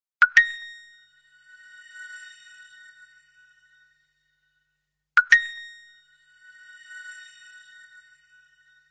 nokia subtile ringtone

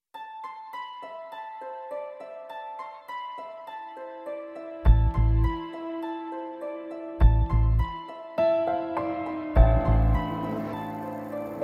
trance ringtone

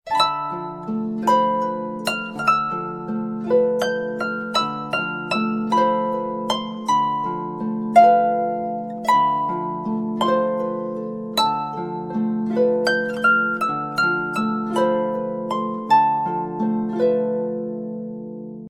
galaxy s4 ringtones

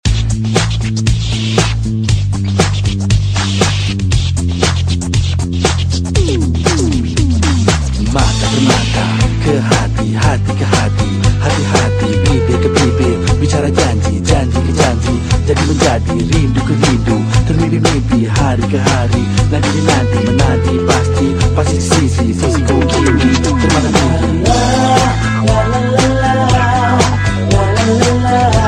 malay rnb ringtone

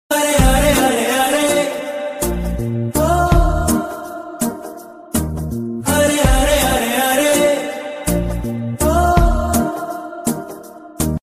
melodious love tone ringtone

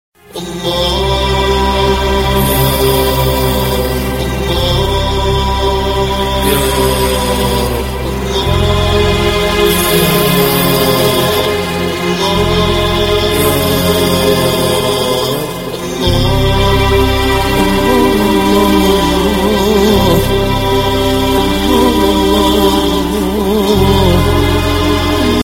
allah ringtone

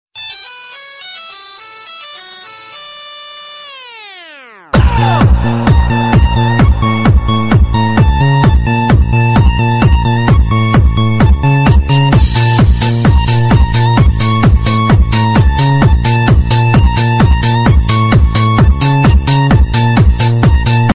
nokia chill ringtone

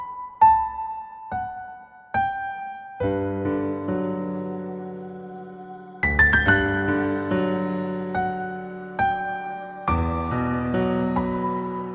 english music ringtone; mobile; english ringtones

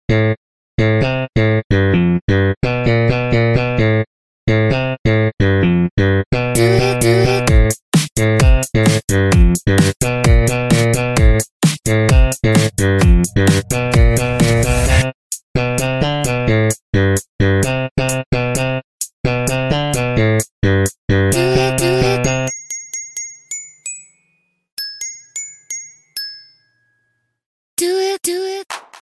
ringtone remix

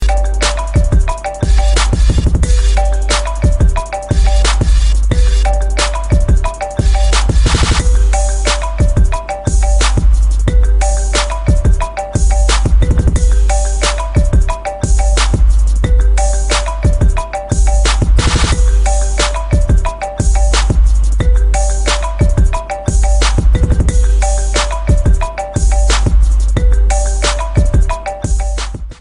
apple iphone 4s ring ringtone

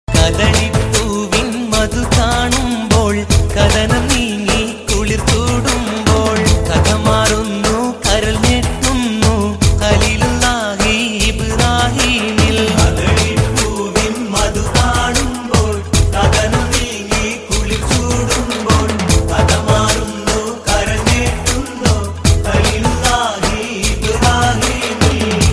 malayalam eid ringtone, islamic ringtones

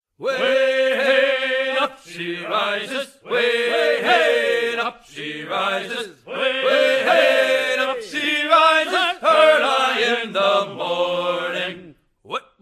drunken sailor shanty ringtone; assassins creed black flag

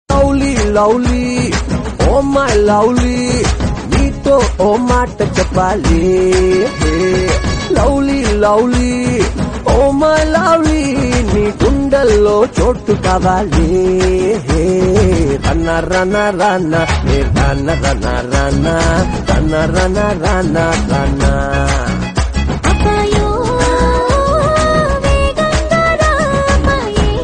bollywood remix song tone ringtone

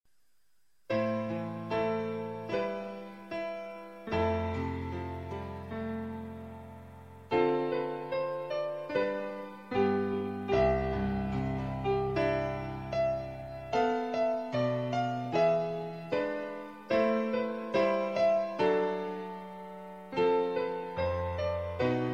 sad ringtone

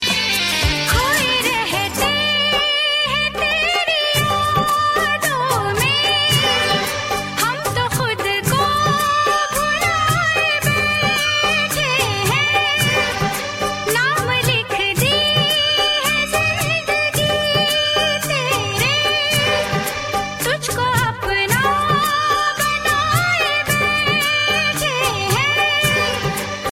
sad tune ringtone

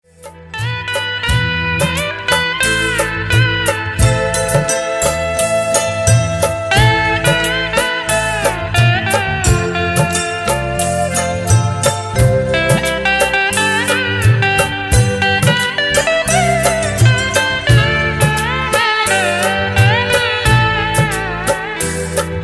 ringtone, bollywood ringtones

